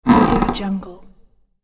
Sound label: haunted house creak